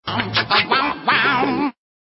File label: Bomchickawahwah